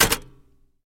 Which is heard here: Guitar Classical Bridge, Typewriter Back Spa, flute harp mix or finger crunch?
Typewriter Back Spa